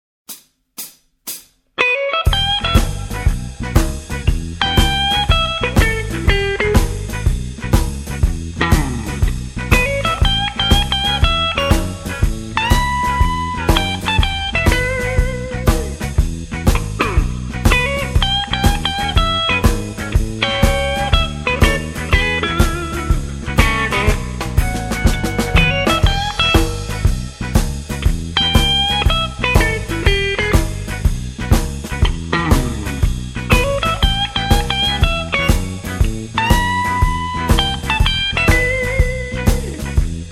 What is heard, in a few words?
blues jazz